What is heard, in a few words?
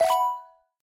ui select function